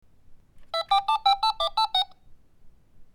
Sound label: toy phone dialling